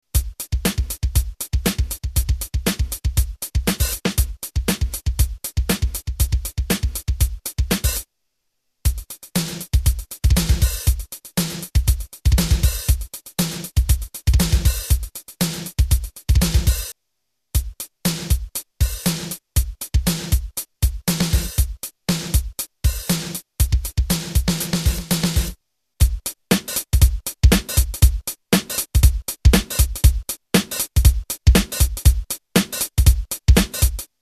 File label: drum pop hrock rock urban; digitech gnx